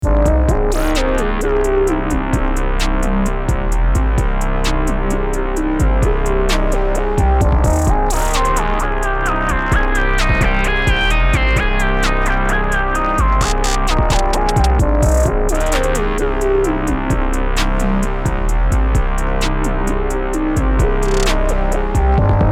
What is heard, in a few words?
neve distortion